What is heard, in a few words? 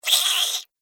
Gremlin Hissy, Monster Sound Effects; horror